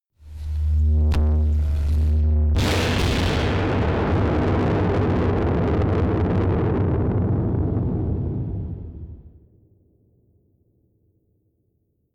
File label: distorted explosions